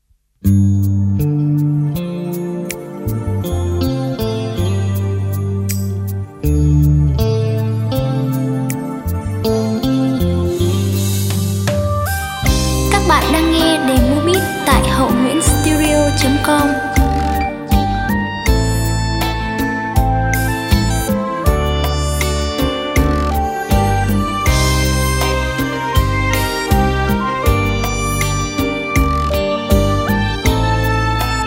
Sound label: beat, playback